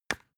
spice jar close

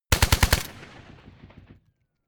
rifle ak-47 exterior fire burst

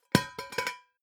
pot lid close plop short; objekte; topf

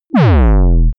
break down short; energie

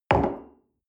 coffee place table soft

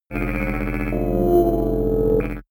abstract synth, energie

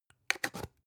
spice jar big scratch close

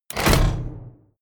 sci-fi contact mine place

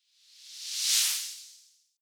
whoosh simple long high; swooshes-swishes

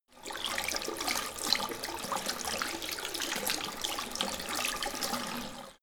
sink medium flow wash; haus garten; badezimmer

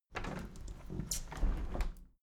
window handle plastic squeak close tilt soft; objekte; fenster

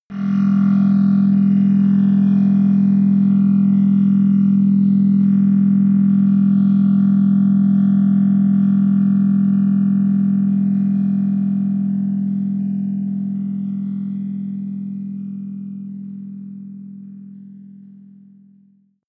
protective shield active

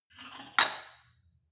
weight rack insert weight medium, fitnessstudio

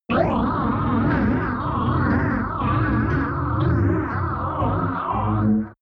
sci-fi meteorite shower, horror

